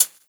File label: rich top end pedal hi hat sound d sharp key; hat samples